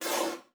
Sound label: Soft Slide Decelerate